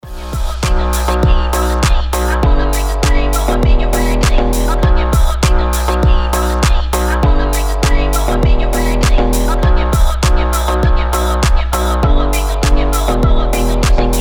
phonk house

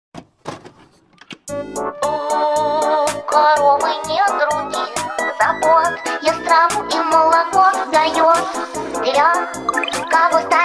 phonk edition

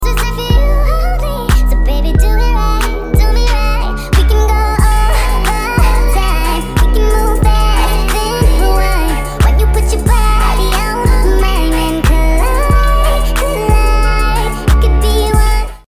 sped up

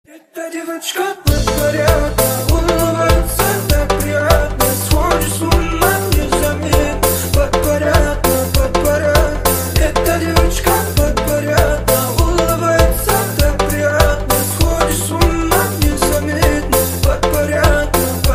lezginka remix

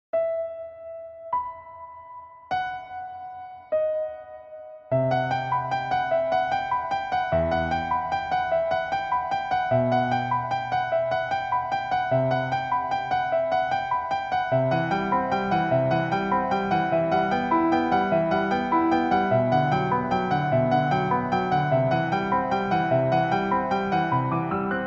piano cover

